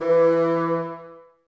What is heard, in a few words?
BNS E 3, Bassoons, Wood Winds; Miroslav Vitous - Woodwind Brass Ensemble; Bassoons Links